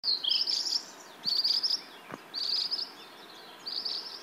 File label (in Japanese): sansyoukui